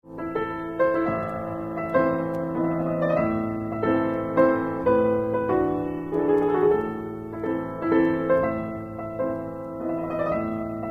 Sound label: mazurka